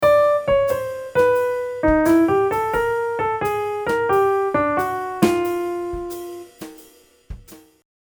bop line

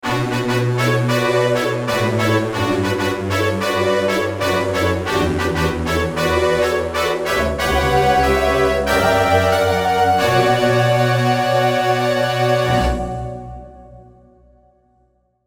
Brass n Voices; Halion

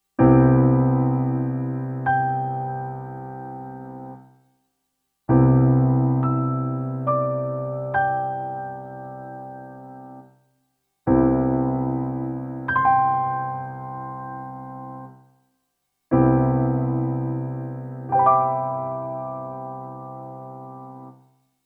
Chimes and Ripples

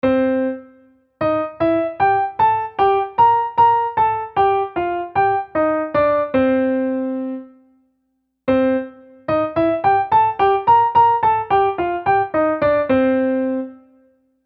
2 speeds

